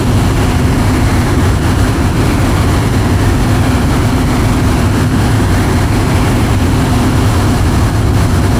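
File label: F-5E J85GE back, aircraft engine; DCS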